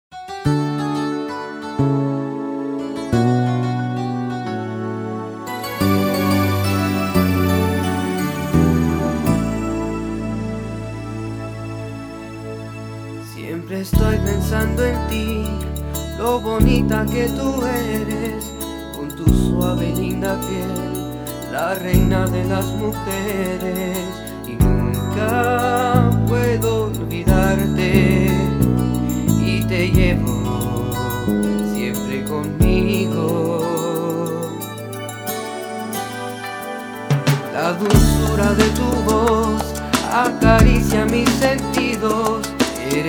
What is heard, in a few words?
Salsa; Salsa Kids